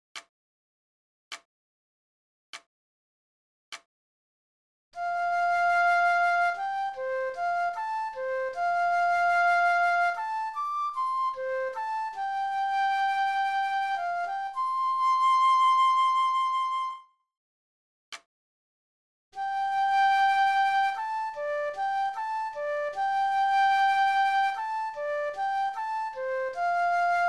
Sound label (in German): Vorspiel; Wilhelm Tell